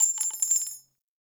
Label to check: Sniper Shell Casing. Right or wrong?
Right